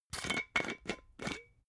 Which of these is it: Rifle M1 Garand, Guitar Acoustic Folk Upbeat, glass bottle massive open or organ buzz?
glass bottle massive open